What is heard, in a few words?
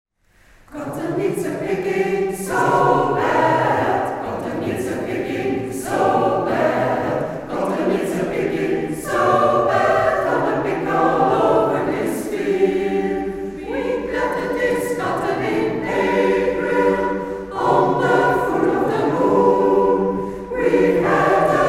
Cotton Needs A Picking Singer; Rosmalen; Tradional USA